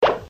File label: jump; crazy runner